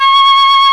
VIB FLUTE F3; ORCHSTR; AKAI S-Series CD-ROM Sound Library VOL-2

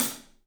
PAISTE HAT, Drums; 3D Sound

